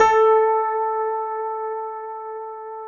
PIA MICRS, MICRO-PIANO, Roland

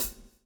swing

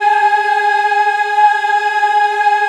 G#4, Choir; USB Soundscan vol.28; Acoustic & Synth; AKAI

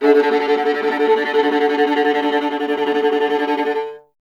viola D; Miroslav Vitous; Solo Instruments VOL-1

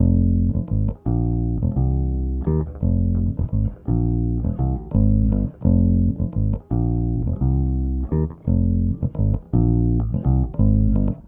Bass, 70s Analog Funk

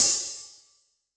Hvy Opn, HiHat; Yamaha DTXpress-II

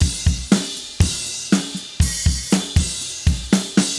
Acoustic Kit, Drums & Percussion

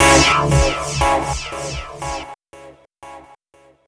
Techno Synth

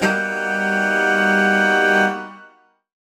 Horn Swell Alt Gmin6maj7; undercover samples

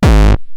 Roland TR-909 BD; BD Selection

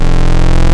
Modular 3 VCO Sqr, wavetables; Animoog

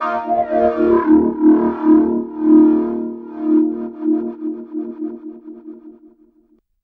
SE OVERD., Overdrivin'; E-MU Producer Series Vol. 6 – Analogia Project; Strictly Synthe; SE-1

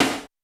Roland U220 Electric Set, Drum Machines

